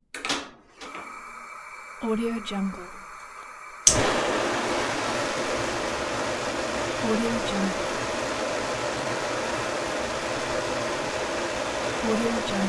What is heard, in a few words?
gas stove